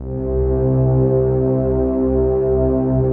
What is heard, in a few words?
rich mellow, Rich Pad; Optical Media International; Sonic Images Library